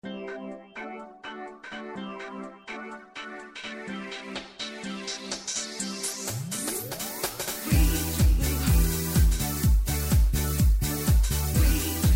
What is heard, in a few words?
house